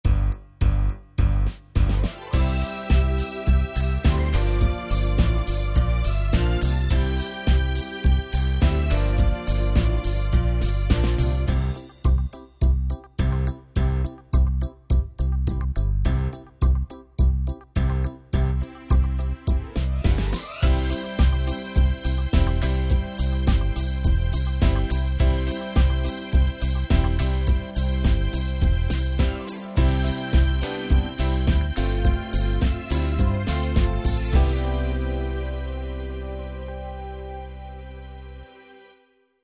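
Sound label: DJ GROOVE C major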